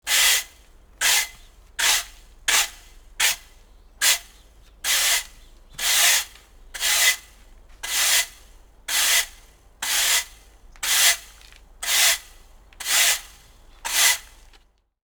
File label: broom sweeps; cleaning